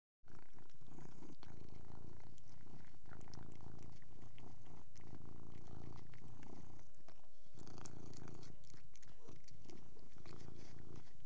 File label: Cat; The BBC Sound Effects Library